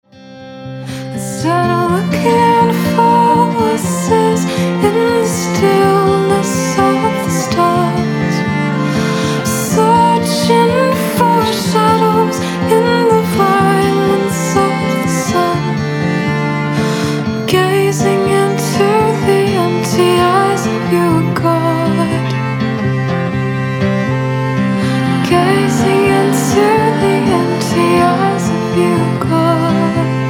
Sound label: acoustic